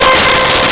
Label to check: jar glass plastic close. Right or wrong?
Wrong